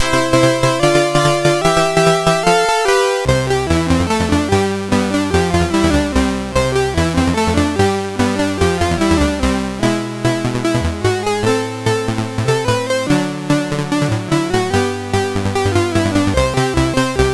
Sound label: Paddle Mania, CSG8580R5